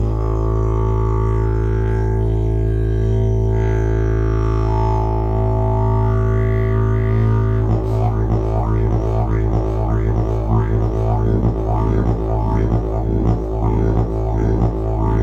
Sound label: ultrabass II, didgeridoo; briza